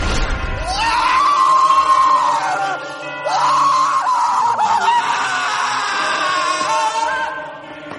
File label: anime girl scream